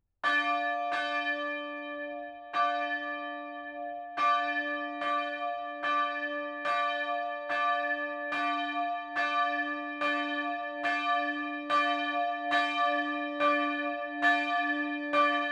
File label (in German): glocke; krien